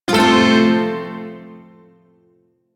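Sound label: Shawzin Major Chord; G; Warframe; Duviri Shawzin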